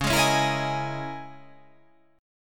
C sharp Minor 6th Add 9th C sharp

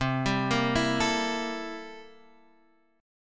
C 13th C; C13 Chord {X 3 5 3 5 5} arpeggiated

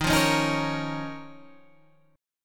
D sharp 7th Suspended 2nd, Guitar, Standard; D#7sus2 strummed; JGuitar

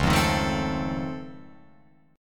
Db Augmented Major 9th, down, Guitar, Open F; strummed; JGuitar

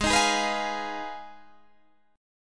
F Minor 7th Flat 5th G sharp, Guitar

